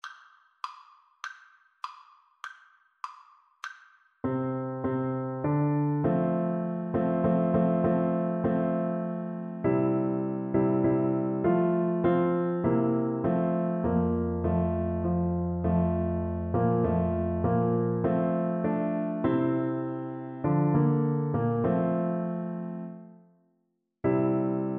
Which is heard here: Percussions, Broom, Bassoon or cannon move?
Bassoon